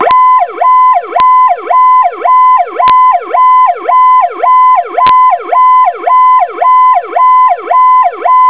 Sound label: Powercall